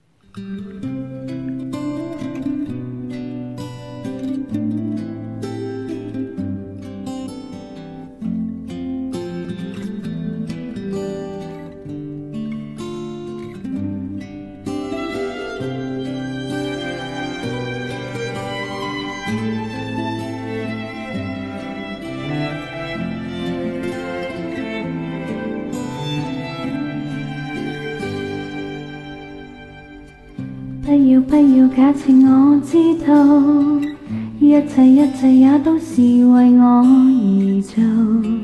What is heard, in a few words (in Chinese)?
翻唱